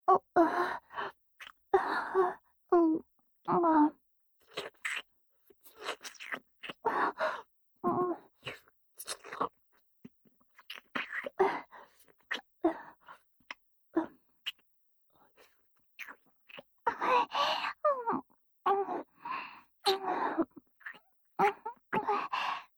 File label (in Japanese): ディープキス